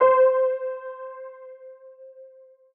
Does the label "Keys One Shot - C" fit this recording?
Yes